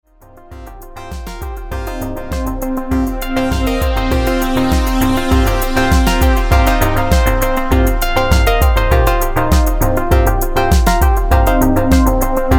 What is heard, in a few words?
Trashbass; L.V. Morris; Astral Plane